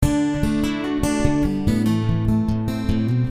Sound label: Picking D,Am 74